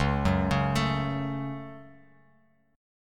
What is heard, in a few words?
C sharp Suspended 4th Sharp 5th C sharp down Bass Standard; arpeggiated; JGuitar